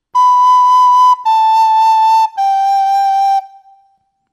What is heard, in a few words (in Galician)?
vibra sopro, Pito Galego